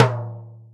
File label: Jazz Tom, Drum Machines Roland R-5